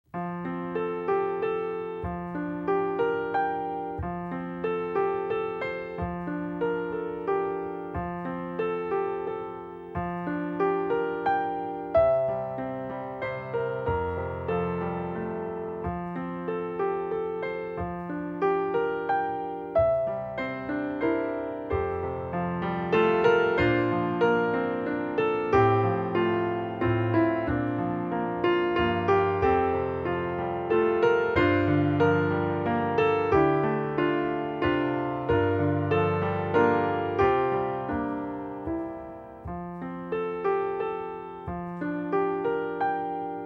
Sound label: accomp